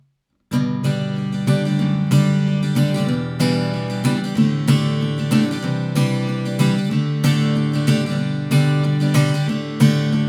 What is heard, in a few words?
Natural Finger Pick